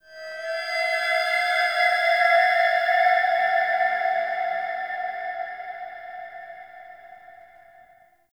Sentient Texture Medium; Warframe; Sentient Lair

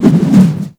Staff Spin; Warframe

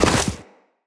Operator Run Deep Snow, Footsteps; Characters

